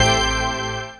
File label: PAD